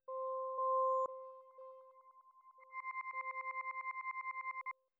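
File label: granular kalimba accent C major; bespoke - Floral v.2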